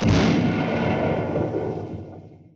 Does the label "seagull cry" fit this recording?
No